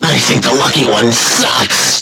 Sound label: Game Over